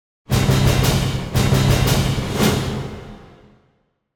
Symphonic Hit Long Triumphant Final; Hollywood Edge; sound designer's toolkit v2

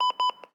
Defibrillator; Medical; Blastwave

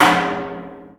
Menu Select

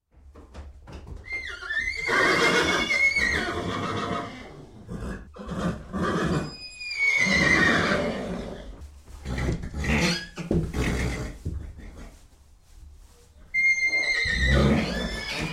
Horse In Stable; Sound Ideas; Series 2000

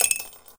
Coin Toss Into Tip Glass; Foley - Rattles

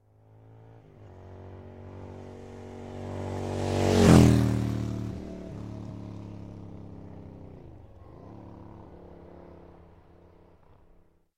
Motorcycle, 1200cc Pass By, Fast; Sound Ideas; Series 2000

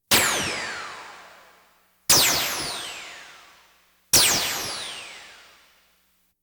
Science Fiction effects, Lasers, Light beams; Valentino Production Sound Effects Library CD50

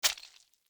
step gore; DeadFright

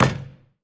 Street Ball Metal Back; SFX Kit

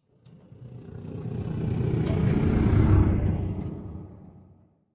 sea object; Blind Drive

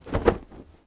FABRIC Movement Fast; mono; Universal Sound FX; CLOTHING

